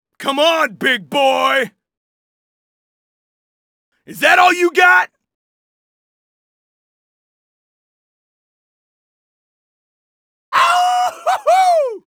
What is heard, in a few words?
death